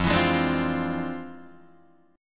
F Minor Major 13th, Guitar, DADGAD

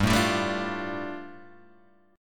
G 7th Suspended 2nd, Guitar Open G

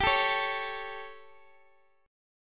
G Suspended 2nd Flat 5th G Guitar Standard; Gsus2b5 strummed; JGuitar

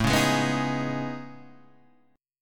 A Major Sharp 11th, Guitar, Drop D

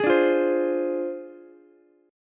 D Minor 7th Flat 5th Ukulele; Dm7b5; strummed

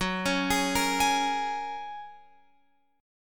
Gb Major Add 9th, Guitar Open F

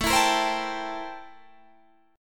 A-Minor 7th Flat 9th, chord

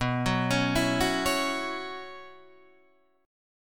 B Suspended 2nd Suspended 4th, Guitar, DADGAD

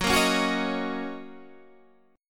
Fsharp 6th, guitar chord

